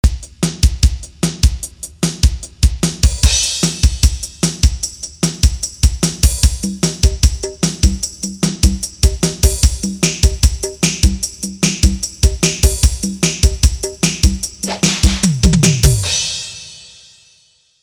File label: M1 Drums